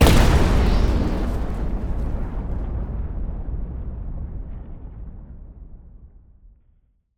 reactor Explosion; Mindustry

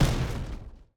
artillery small; Mindustry

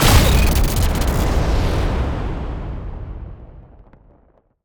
accelerator Launch; Mindustry

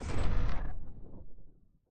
walker Step; movement; Mindustry

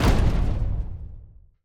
block Explode Alt; Mindustry; explosions

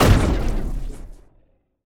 shield Break; Mindustry

